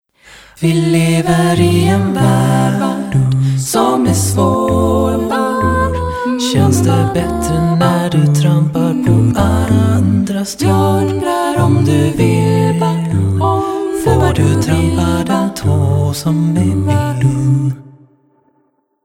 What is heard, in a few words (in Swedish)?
musik